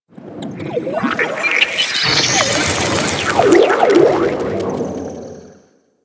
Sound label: Excavation Scanner Random; Missions